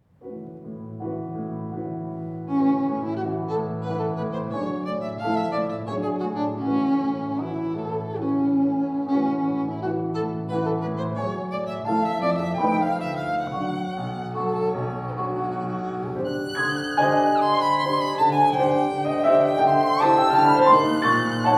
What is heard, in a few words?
Violin and Piano, Allegretto leggero e scherzando; Prokofiev Five Melodies for Violin and Piano Op. 35 IV.